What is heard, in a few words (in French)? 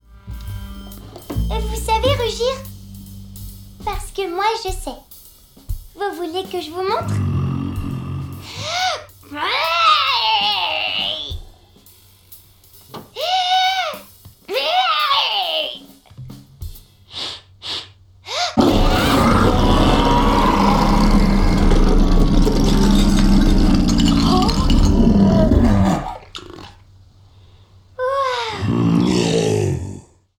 rugissement